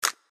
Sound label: Enemy Arm; kritter krawler